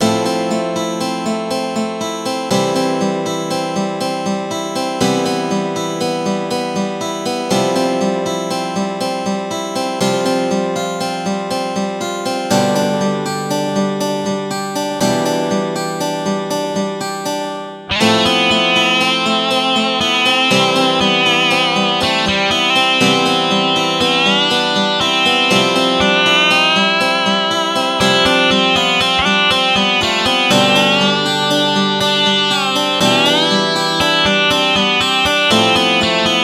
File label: Guitar Solo